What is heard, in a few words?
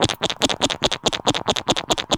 wiggle